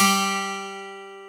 12STR, PIANO; AKAI S-Series CD-ROM Sound Library VOL-8

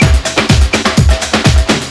stomp, Deep House, 125bpm

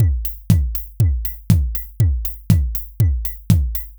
Anabeat Mix, Beats

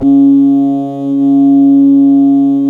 C#, Synclav FM, WIND; Big Fish Audio

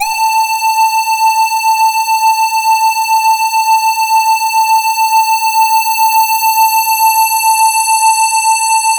LUCKY SWP, SYNTH; Keith Emerson Signature Series

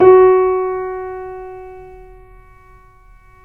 YC7 piano, pp; Roland L-CD701; pp YC7 St

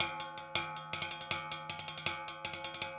metal; Zero-G; Monster Beats

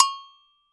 HIGH AGOGO, BRAZIL

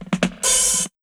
Big Beat Drumloops, 138 BRK; USB Soundscan vol.25; AKAI; MOVE 138

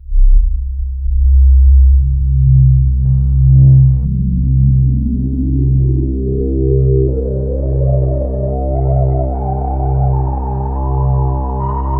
FX SWEEP

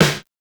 Snare, Fairlight CMI III

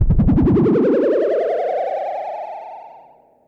Classic FX; radiosonics; Classic Simple OSC FX

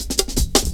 Drum Loops, Jungle

